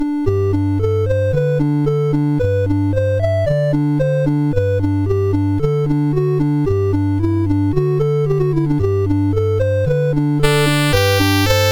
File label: Choralvorspiele, MOS6581R2